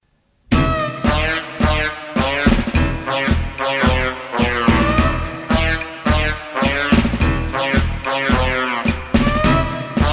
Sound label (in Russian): tango; Shaltaya Boltaya i Alisy